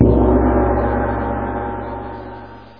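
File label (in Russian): гонг одиночный яркий в пространстве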